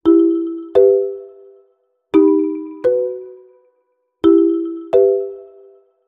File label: Sparse Documentary Sting